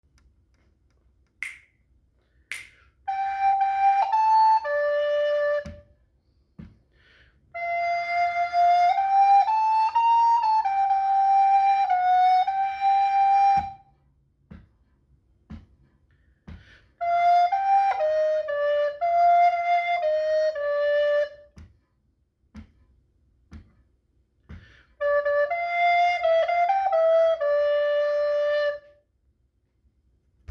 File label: Alto Part B, recorder